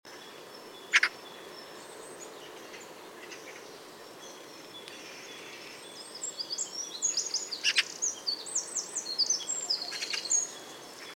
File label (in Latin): Pica pica; SETE